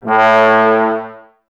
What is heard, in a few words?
Brass, Woodwind Brass Ensemble; Miroslav Vitous - Woodwind Brass Ensemble; Tbs